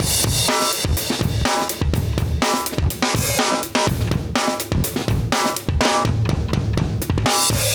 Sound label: 124 live breaks